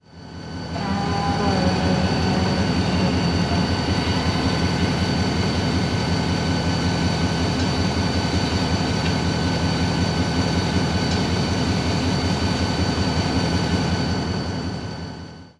Brake Automatic Release; RailPro

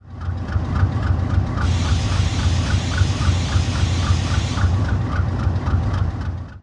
Sanding; RailPro

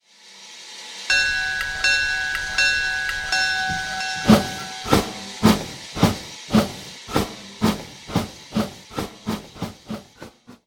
Bell Howard Steam; RailPro

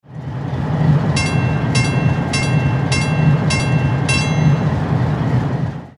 Gong Bell; RailPro

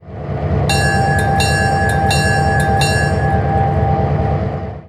Bell Bronze; RailPro

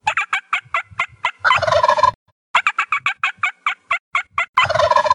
Turkey; RailPro

